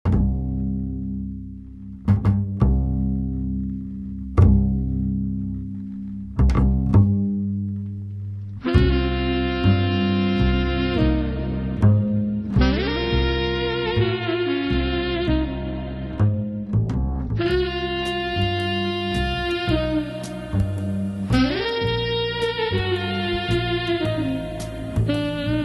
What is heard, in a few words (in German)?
saxophon; gitarren